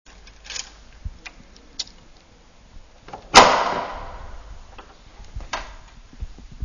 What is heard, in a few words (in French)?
texture ouvre porte